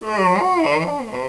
aaaaaa

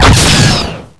sniper zoom

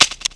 dry fire weapon

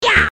shoot wrench; luigi; supermariobros

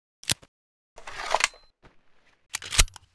vp70 draw first seq

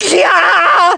pain; krillin

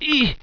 pain

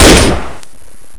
oicw, weapons

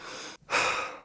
sprint breathe, police

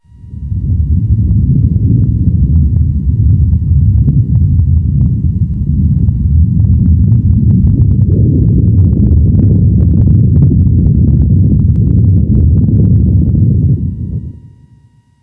earth rumble

